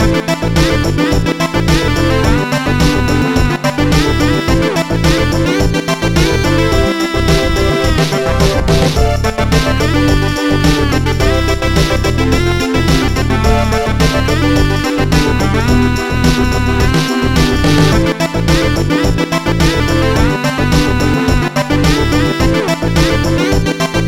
KEYGEN MUSIC RINGTONE; IDEA TRACKER

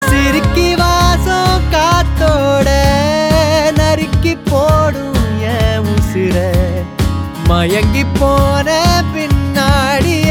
male ringtone

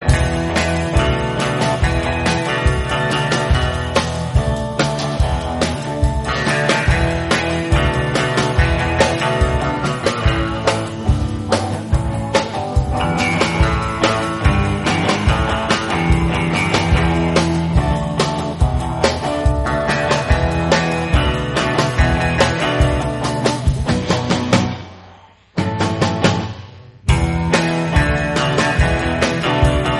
instrumental ringtone

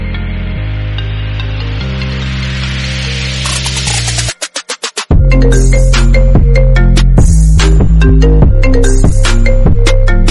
IPHONE REMIX

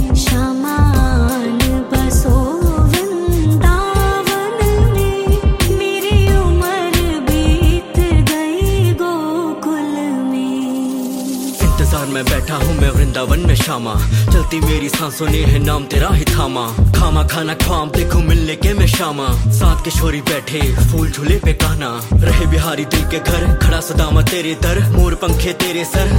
HINDI RAP RINGTONE

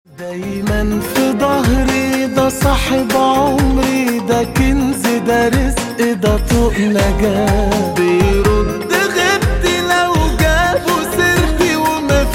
ARABIC SONG RINGTONE

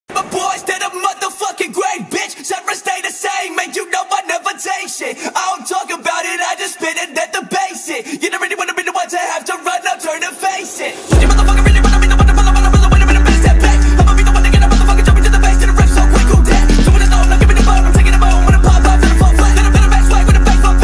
ANIME RINGTONES; COOL ANIME

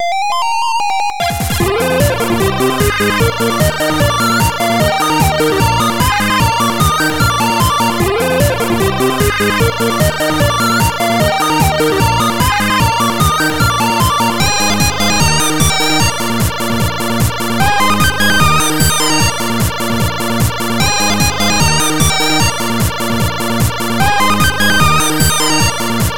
KEYGEN MUSIC RINGTONE; BACKUP MADE SIMPLE

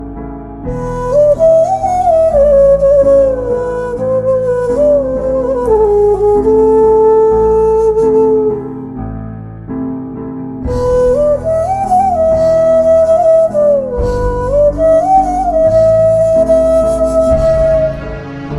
FLUTE RINGTONE; BHARAT

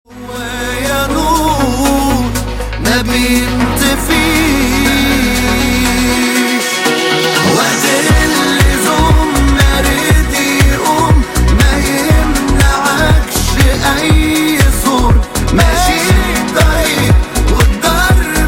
ISLAMIC MUSIC RINGTONE

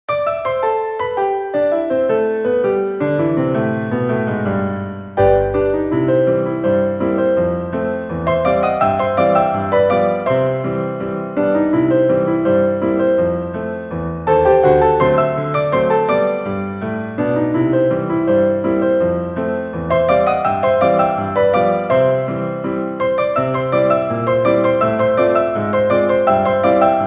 instrumental ringtone

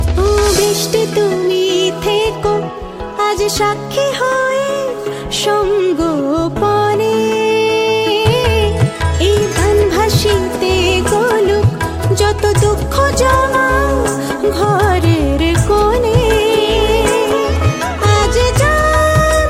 song ringtone

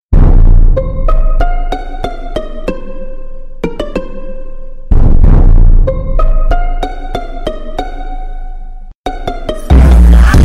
TRAP REMIX BASS BOOSTED; AMONG US

